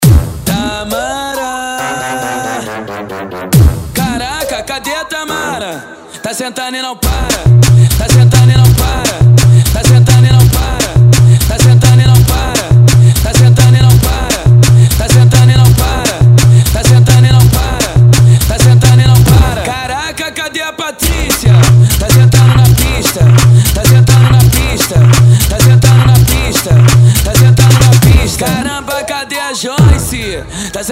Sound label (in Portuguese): eletronica; paraná; edição fervo 2016